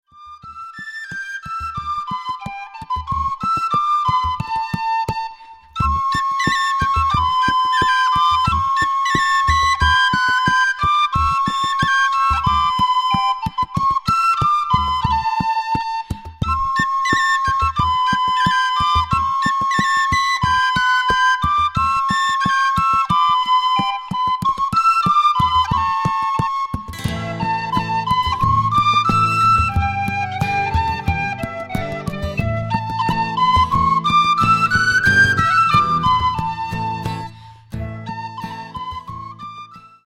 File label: Polka; Ballydesmond